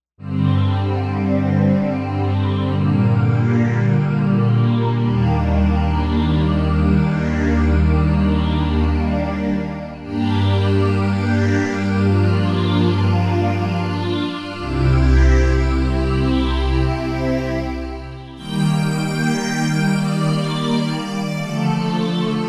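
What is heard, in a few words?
Strings Phaz Strngs, synth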